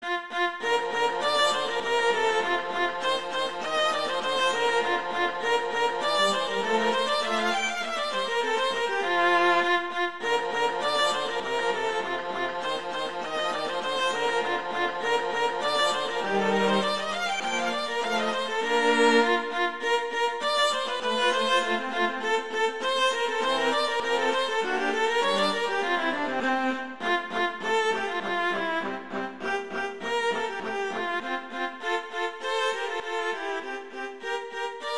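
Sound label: Duet, Rondo Allegretto, viola; Mazas; Duets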